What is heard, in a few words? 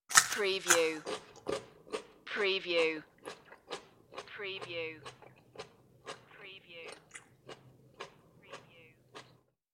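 Eating Carrot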